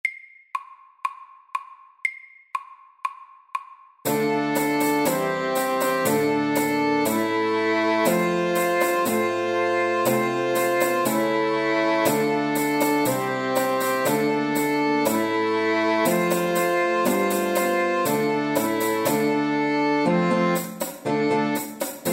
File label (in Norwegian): Old English dance FORT